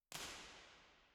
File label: First IR Sala 2800 Dodec Center WY, Soundfield; Santa Cecilia Roma; Measurements 2005